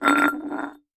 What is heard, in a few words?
Glass Bottle Roll On; bottles